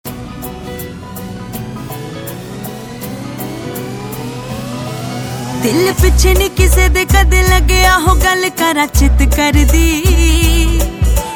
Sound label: punjabi song